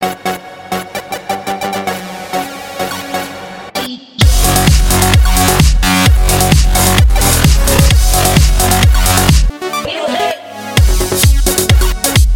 Remix elektro

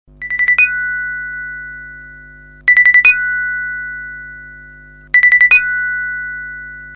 I Phone Message

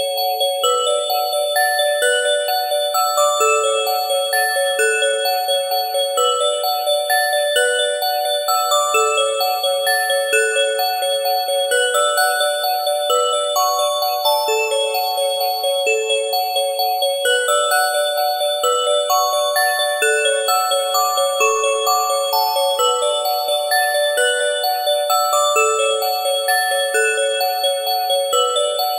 Lullaby

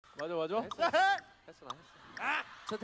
Giggle

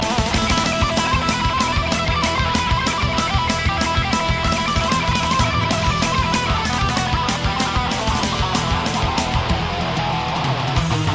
Solo

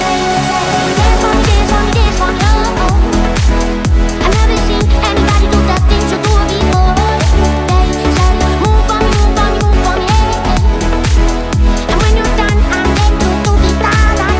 Remix

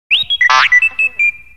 R2D2 sound

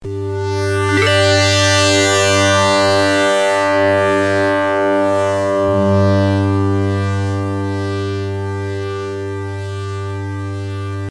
Ecco Synth Tone

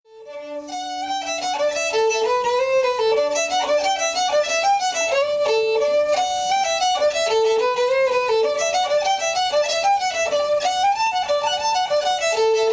Lively Fiddle

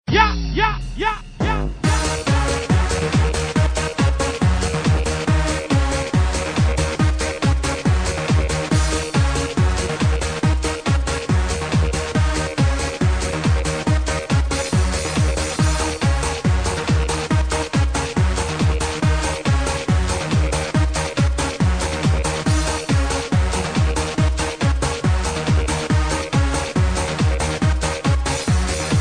Dj Drum; Manieczki

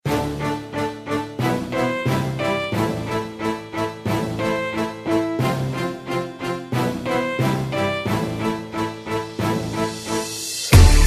Bg Rap

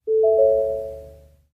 Gong

